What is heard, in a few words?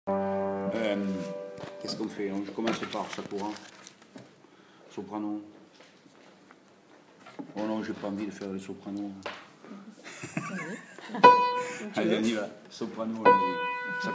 soprano